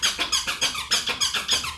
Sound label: pouicks